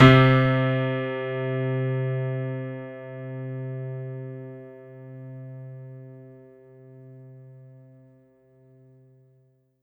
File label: Rock Piano C3; Korg N1R